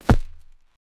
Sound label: equip heavy leather